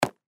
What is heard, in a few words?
baseball glove catch